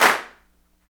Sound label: CLAPS, Africa Handclaps